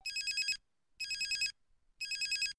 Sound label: motorola startac ring style ringtone